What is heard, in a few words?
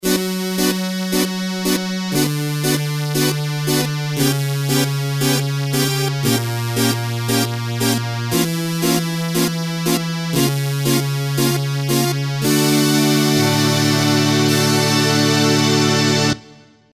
elka rhapsody 610 strings piano clavichord